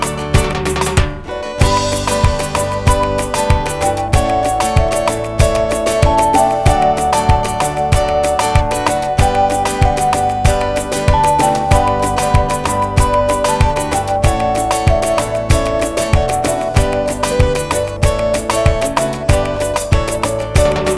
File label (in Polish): (WERSJA INSTRUMENTALNA)